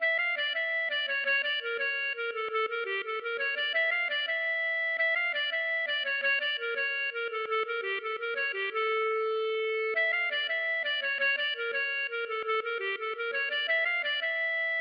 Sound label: klezmer Jovano